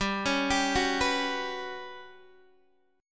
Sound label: G Major 7th Flat 5th, Guitar DADGAD